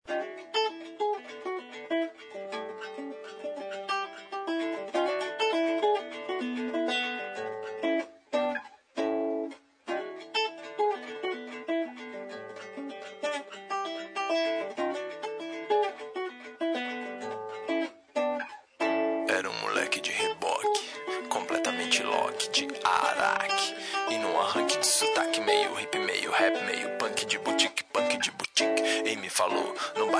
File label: punk de boutique